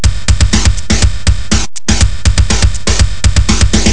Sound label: techno